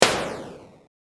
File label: gun